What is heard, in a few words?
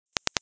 3 clicks